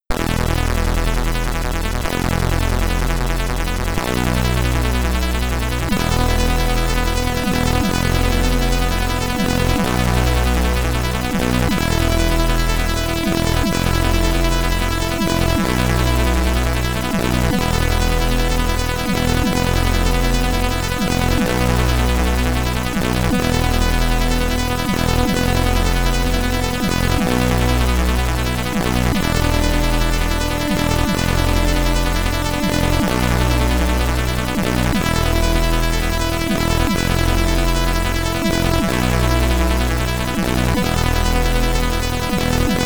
Boss Theme, SID Stereo; Kessen; Ninja Spirit; commodore 64

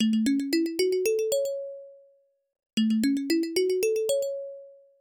Stereo Test

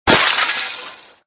Ice Smash; Extra Lives

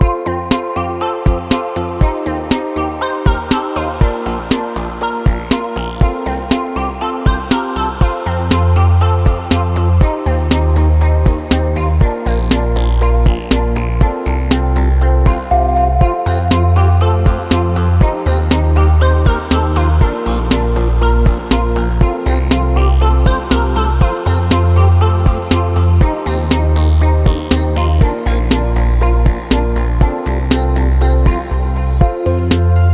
Underwater Gameplay Loop; gamerip; Human Rocket Person

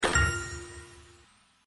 yes sound

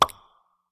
Candymachine; Candy Crush Soda

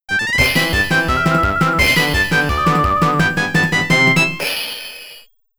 Game Success

